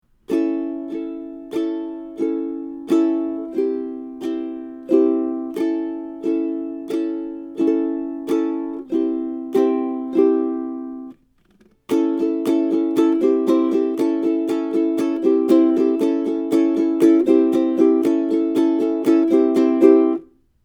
Strum, ukulele

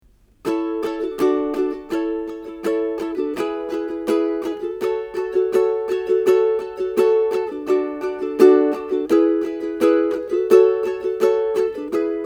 Strum, ukulele

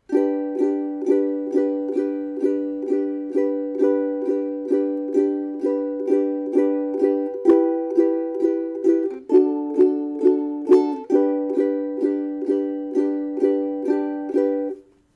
Strum, ukulele

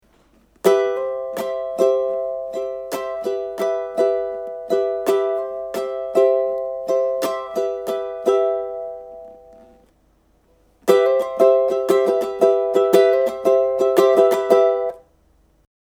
strum, ukulele

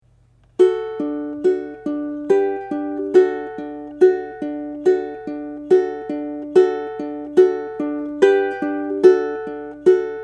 Love Pick, ukulele

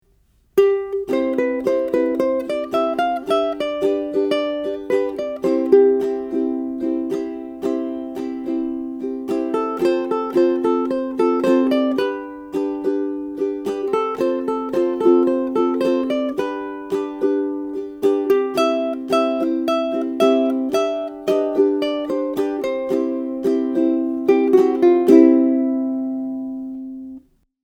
Solo, ukulele

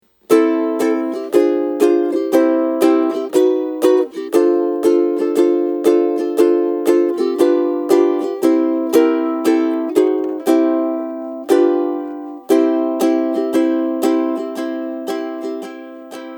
Face Strum, ukulele

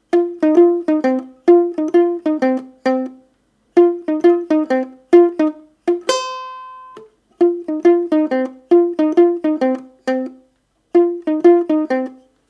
Bassoon Riff, ukulele

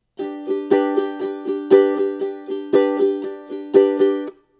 du DU du DU, ukulele

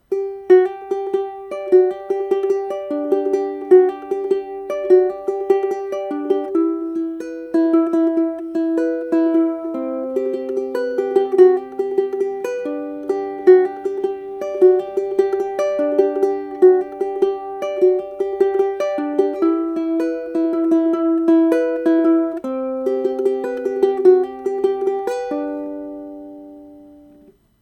Heartbeats, ukulele

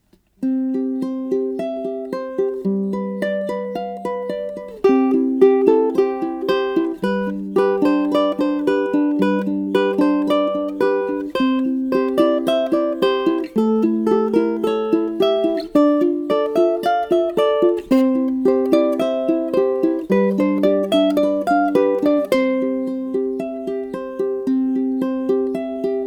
Duet, ukulele

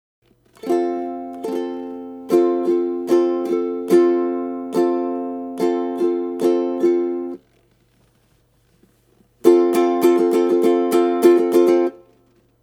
Carrot Strum, ukulele

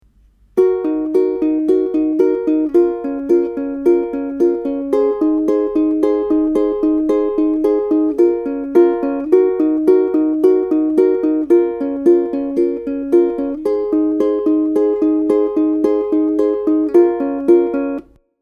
Picking, ukulele

